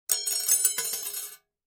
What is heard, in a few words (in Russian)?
zvuki viazaniia